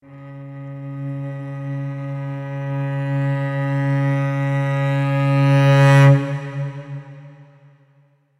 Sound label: Cello; SparkVerb